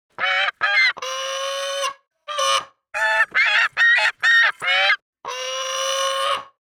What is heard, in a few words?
Magnificent Falcon